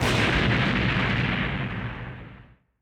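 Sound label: Battle Special Move Finish Explosion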